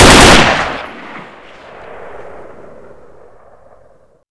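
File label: machine gun; svencoop; echoes; hgrunt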